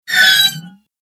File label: agressive motion